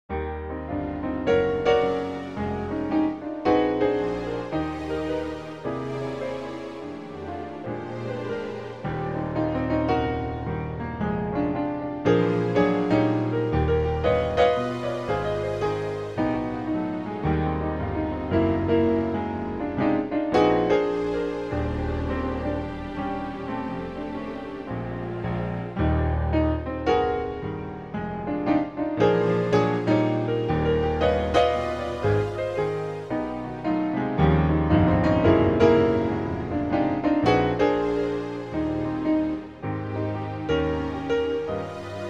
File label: Piano + Strings; YAMAHA PSR-e473